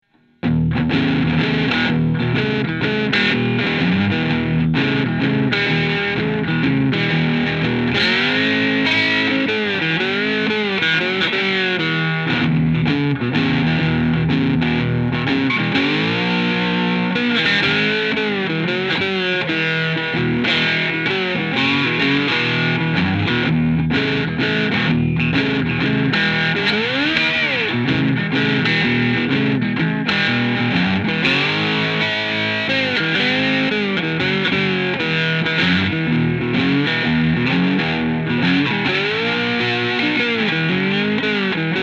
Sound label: Germanium SlideHB; IT-11; Germanium Hazard